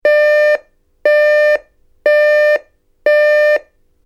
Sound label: household clock digital alarm beeping; McKinney Sound